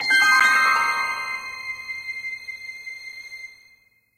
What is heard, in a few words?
Eerie Stinger